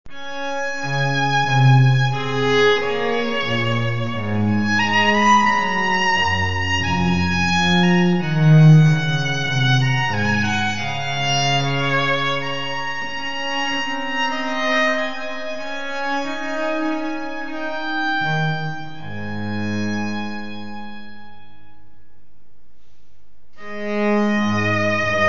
adagio

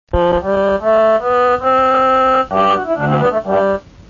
acordeon

